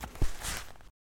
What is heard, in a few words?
Equip; SCP 268